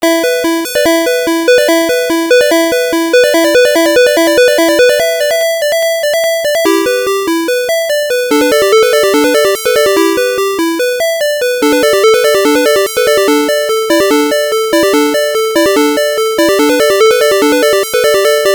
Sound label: Chord B